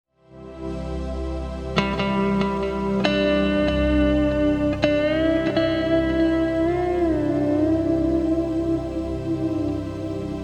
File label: Pulse Tone, Plate Reverb; Dyna; CE2B